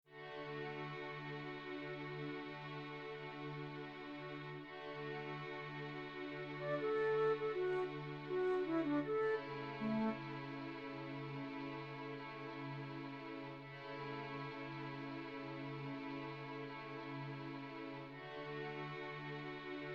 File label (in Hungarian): D-dur intro fuvola kvartettre